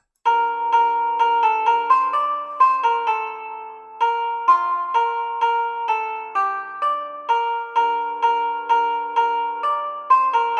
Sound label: Uke; Bransle de Champaigne